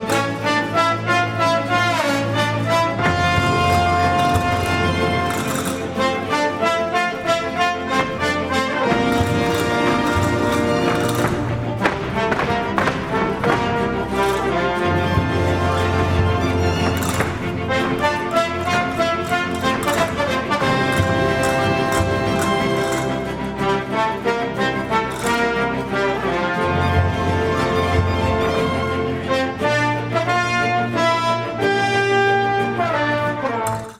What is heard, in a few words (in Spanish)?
Viento metal